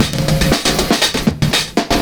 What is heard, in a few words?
FILLS, 70_s Breakbeats